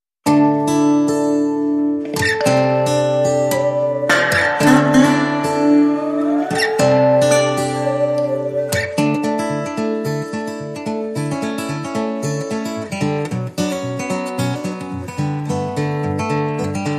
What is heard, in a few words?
Cross Country Guitars